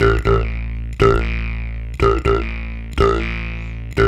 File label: 120, African Contemporary; Spectrasonics; Supreme Beats; Bash Novel